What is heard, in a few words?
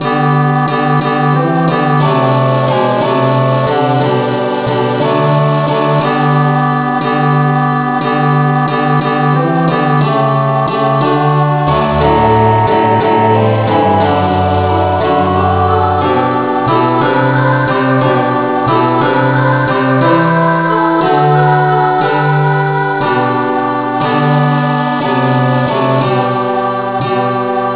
angel voices rev no vocal key g